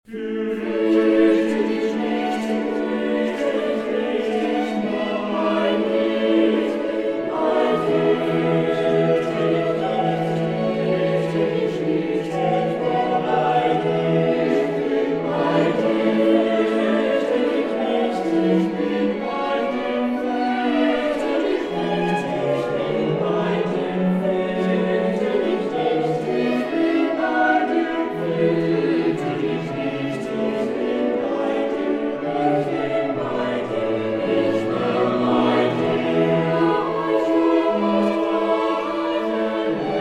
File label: German Sample